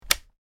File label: Notebook Computer, laptop